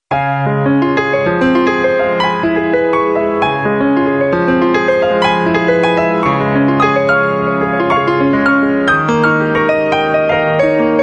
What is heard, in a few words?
Clair de Lune, Motorola; A920